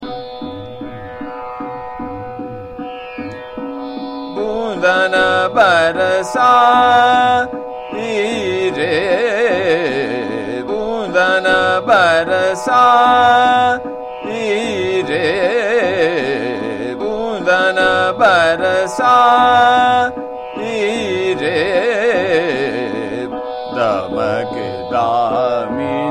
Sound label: Chota Khyal - Trital, Megh Malhar; Boondan Barsaee Re